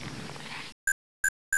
gun switch, weapons; XDM

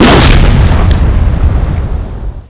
rocket explode; XDM

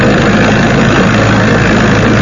train, vehicles; XDM

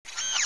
console; XDM

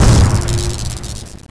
gun fire, weapons; XDM

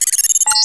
window; XDM

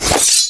sword select; XDM

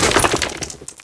concrete, debris; XDM

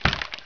flesh, debris; XDM

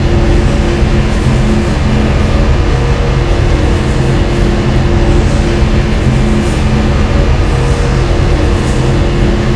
big machine loop; XDM; ambience

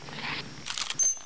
357 reload, weapons; XDM

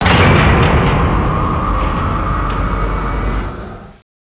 powerup; XDM; ambience

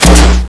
rpg fire, weapons; XDM

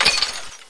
glass, debris; XDM

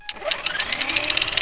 turret spin up; XDM

